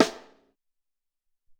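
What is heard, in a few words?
Ambient Rim 2, Alesis SR-18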